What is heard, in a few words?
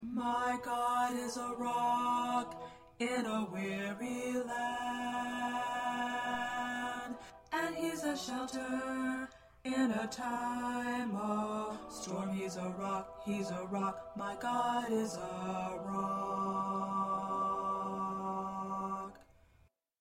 2nd Tenor, choir parts